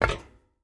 footstep hide metal jump land; Rust

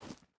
footstep boots stones jump start; Rust

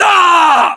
death, voiceovers; english

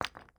ui drop bone; Rust

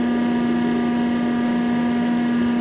cannon turn loop, tanks

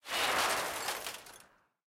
aa explosion debris; War Thunder

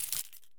belt drop; Escape from Tarkov

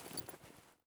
gear stereo; Escape from Tarkov

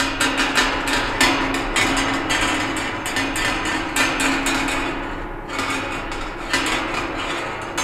Amb Constructions; Red Orchestra Ostfront 41-45

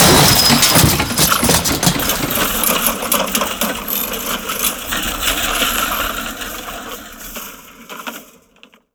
tank crash barrier; mrk tank crashbarrier

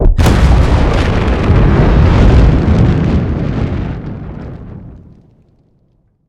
damage vehicle; World Of Warships

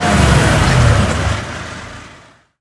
T72 Engine Acc End; DCS

